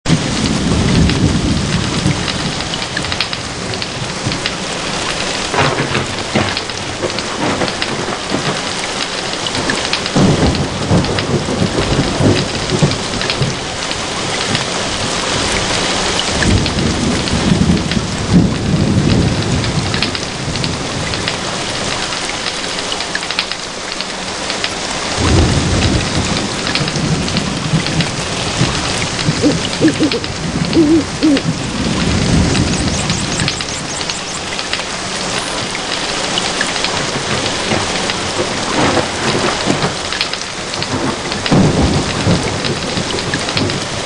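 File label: rain in the forest 3D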